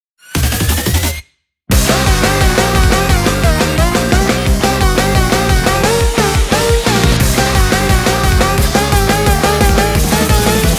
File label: Instrumental